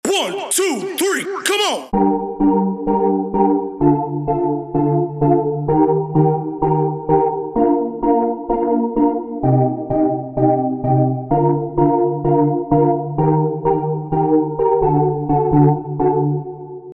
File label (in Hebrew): רצף אקורדים